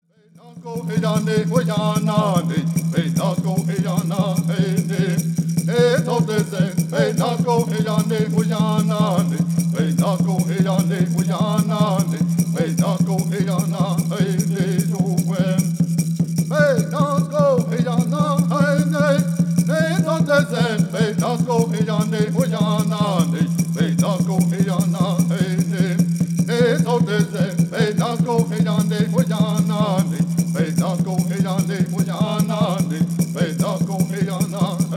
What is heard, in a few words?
Peyote Song